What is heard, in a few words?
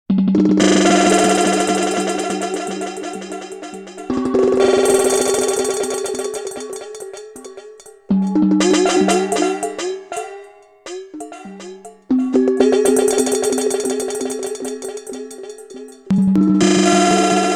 Sequential Prophet X Glitch Bowls